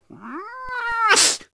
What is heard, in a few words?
hiss, Catz